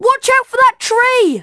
Flying Car

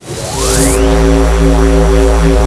phazon beam charge; MetroidPrimeGC

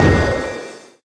spell hit; HPSS PC

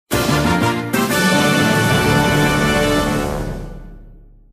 Fanfare; Kirby's Return To Dream Land

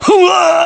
Damage Fly; SSB Brawl; Falco

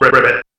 froggy

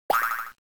BOMB Bound; WarioWare, Inc.

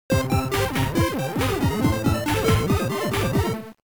BGM BOMB; WarioWare Inc

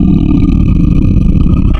zombie dog growl

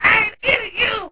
parrot; Clock Tower

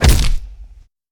melee placeholder; GTAIV

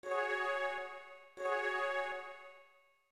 Continue; SmashBros

